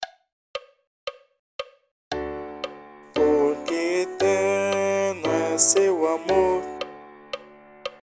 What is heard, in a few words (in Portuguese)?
Hino Pascal Coro